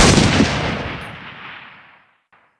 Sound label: jackhammer, weapons